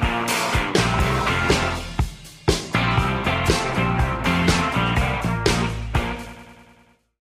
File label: Windows 95 Startup Sound